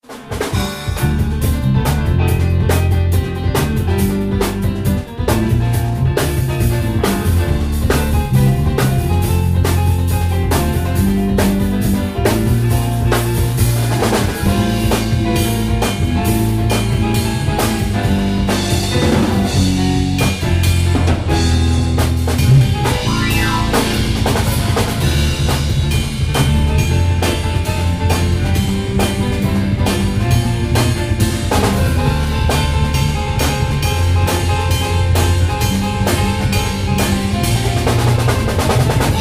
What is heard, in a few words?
piano solo